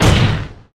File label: desk slam